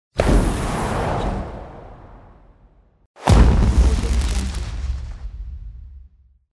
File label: firework